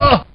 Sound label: hit; BattleSNDS